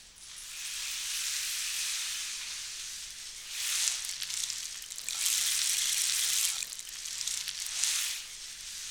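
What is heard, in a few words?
percussion rain stick; Roland - Rhythm Section; Water Perc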